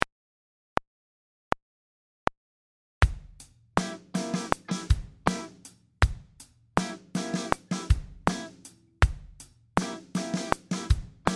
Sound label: groove r&b